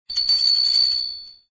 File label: Wind tone